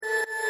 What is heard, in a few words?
step forest